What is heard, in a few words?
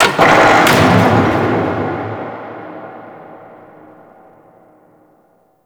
Distant Gates; Warframe; Grineer; Fortress; Ambience